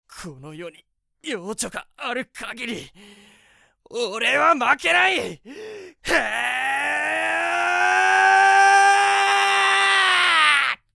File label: shout